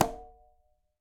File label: Conga A Slap Open; Latin Percussion; Drums; Drum Hits; Ableton; Factory Packs